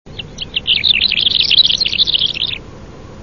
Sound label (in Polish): skowrończyk mały - Calandrella rufescens; skowronki; wroblowe; dotychczas w Polsce nieobserwowany